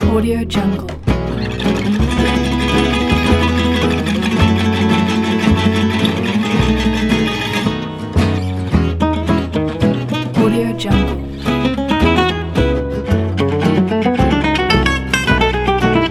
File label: mafia gypsy jazz